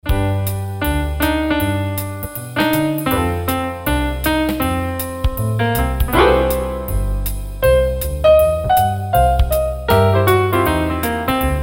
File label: Piano Impro B